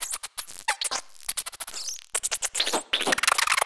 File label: Tiny Creature Small, Monster Sounds; Monster Sounds Volume II